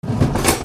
KITCHEN

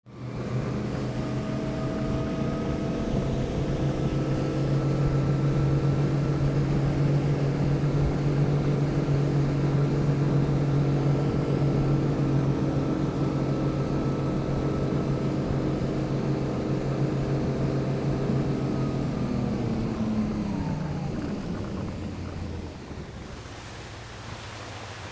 BOATS

